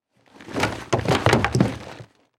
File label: Impact Firewood Drop; Impacts